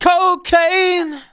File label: vocals sung F#; Techno Trance Essentials